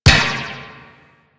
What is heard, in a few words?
Ball Bounce Metal; Missions; Tenno Ball